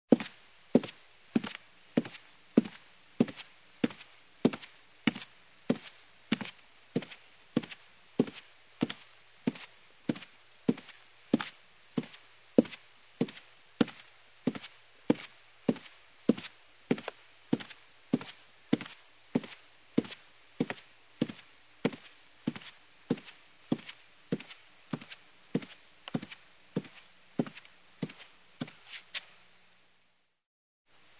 Heeled steps on carpeted wood floor

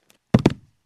Barefoot jump on the wooden floor; steps

